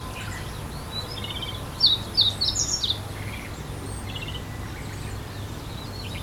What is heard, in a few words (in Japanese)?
komadori; ホオジロのさえずりの前後に聞こえる震え声; コマドリ; 今朝聞けたコマドリの声; 野鳥園